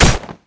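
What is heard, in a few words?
damage mace cloth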